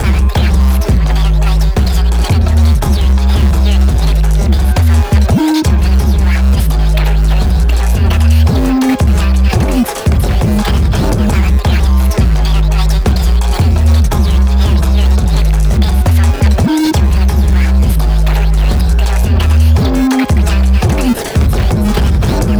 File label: phonk freestyle